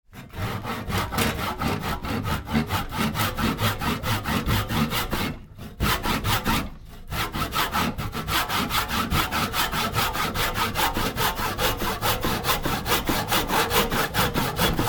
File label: sawing wood